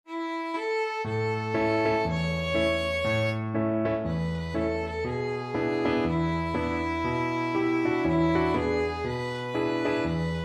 violin

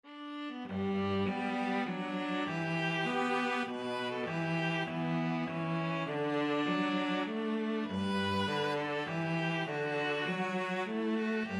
star spangled banner, viola cello duet

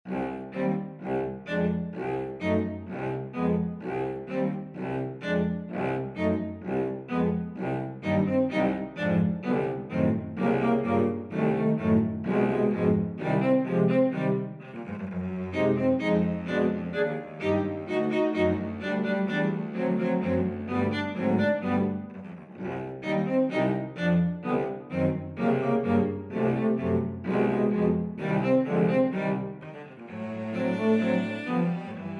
cello quartet; tchaik; sugar plum

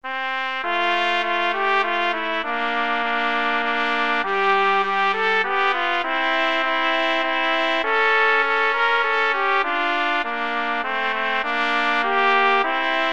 trumpet duet

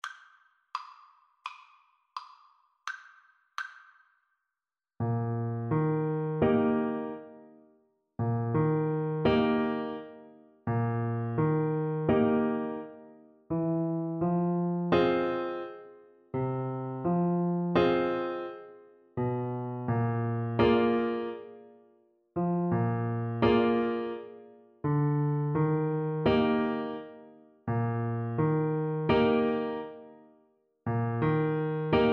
Soprano Saxophone

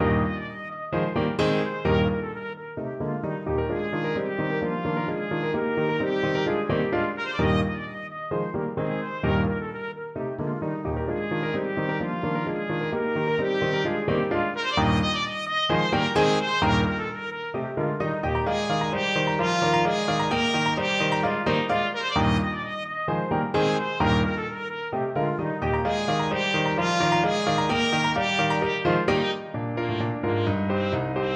trepak, trumpet; nutcracker trepak

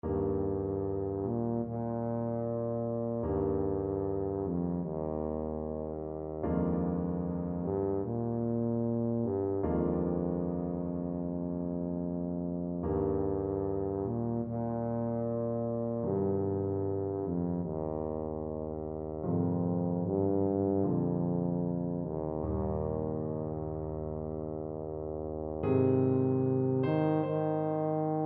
dvorak largo, tuba

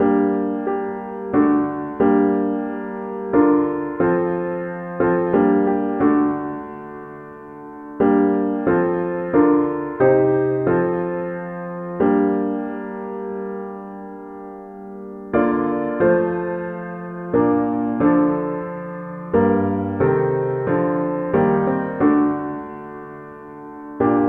piano

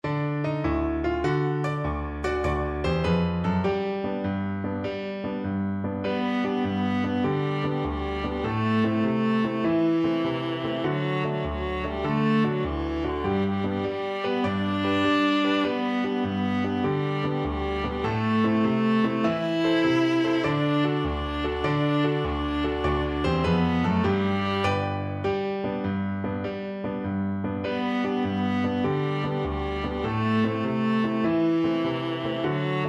viola

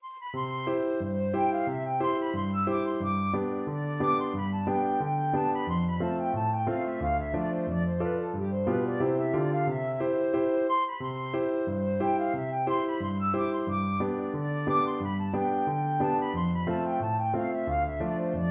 sailors hornpipe, flute

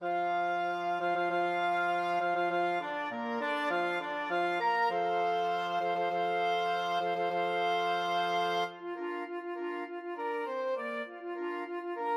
flexible mixed ensemble, 4 players

